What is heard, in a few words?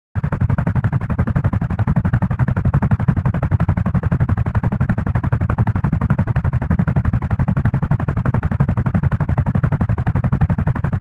helicopter rotors mid; Rust